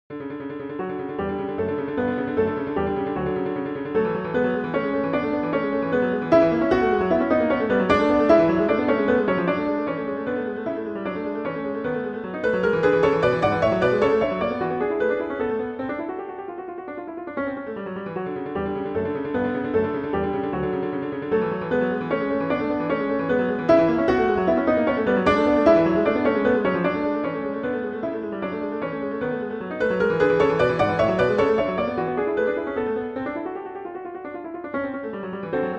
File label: Andante grazioso; Mendelssohn; Op 30